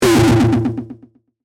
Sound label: Distortion Delay; BlastWave